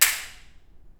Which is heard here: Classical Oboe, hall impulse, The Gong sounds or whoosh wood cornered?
hall impulse